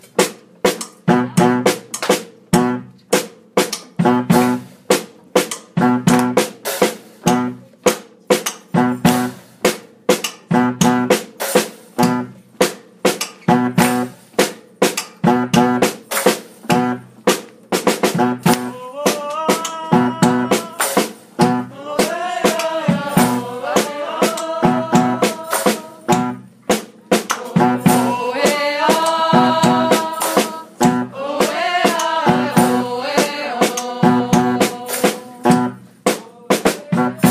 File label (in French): Basse chant totale